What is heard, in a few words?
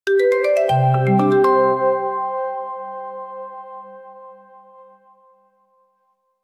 Distinctive Melodic Motif Marimba Or Vibraphon; Brand Logo Sound 5-7 Seconds; jingle ou logo sonore